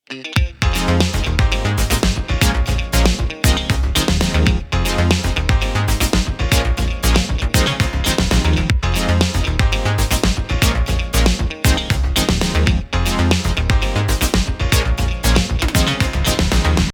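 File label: post-chorus guitar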